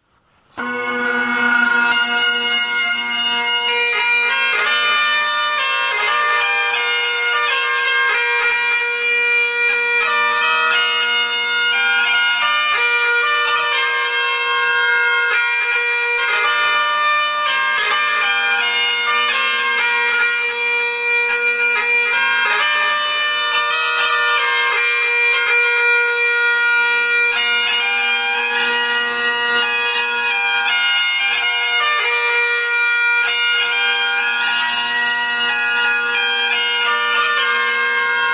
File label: Bagpiper; Baltimore